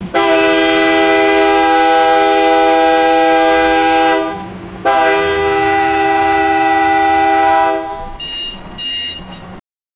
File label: haunted diesel train horn, blast